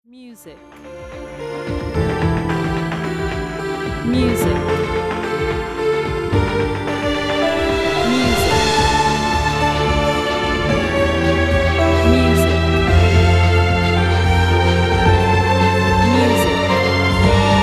TRPG用BGM

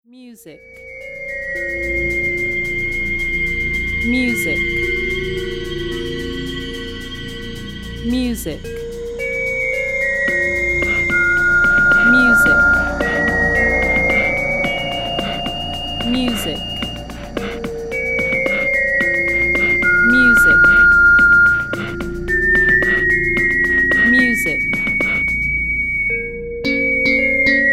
某ホラー番組のテーマ風BGM

